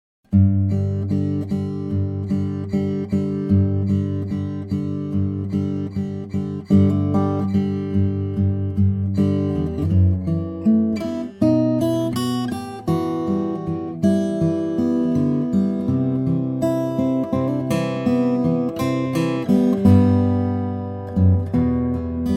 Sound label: guitar